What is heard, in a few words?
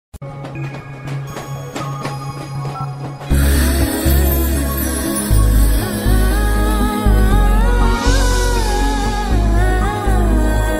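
love background music; azhagiya tamizh magan; thalapathy vijay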